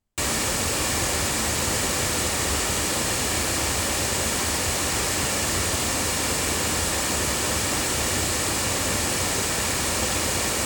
Violet Finger loudspeakers, microphones

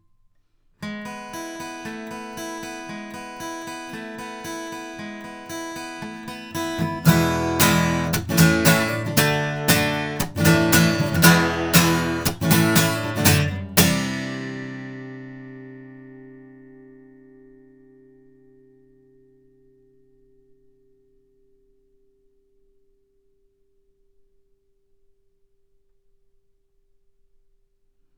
Earthworks QTC1 guitar, microphones

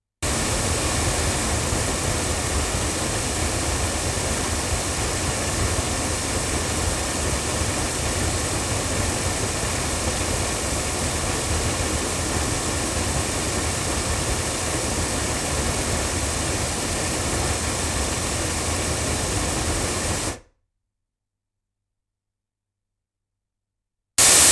Oktava MK012 hypercardioid loudspeakers

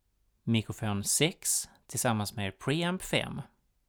mic pre

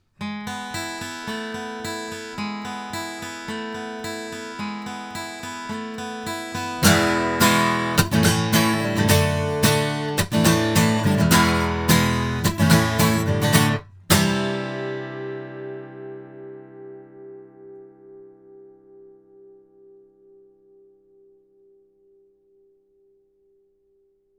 guitar; Pearl CB22; microphones

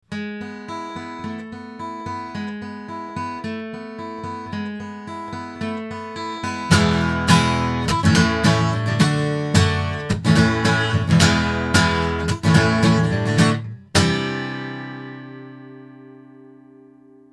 Royer R-101 front guitar

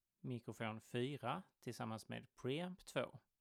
mic pre

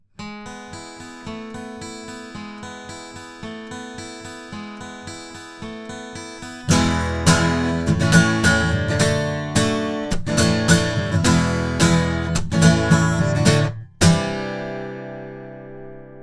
CV-28 cardioid guitar; Avant

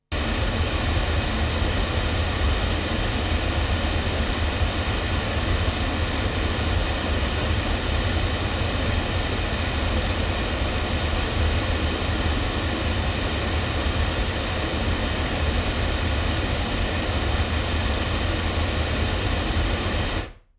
Oktava MKL-5000 omni loudspeakers

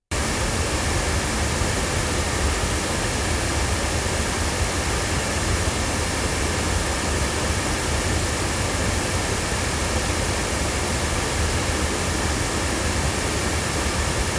Blue Bluebird loudspeakers

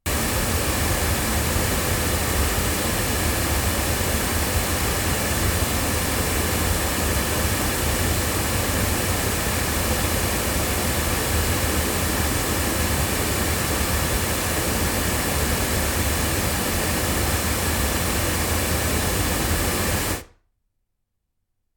loudspeakers; LineAudio CM3; microphones

